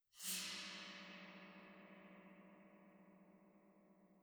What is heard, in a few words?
tam tam; Netease; gank